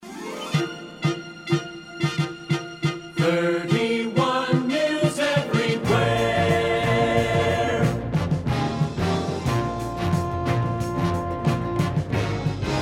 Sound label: news everywhere hardbeat